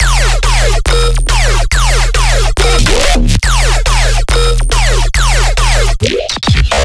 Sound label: bass loop triplet b section 140 D#; Virtual Riot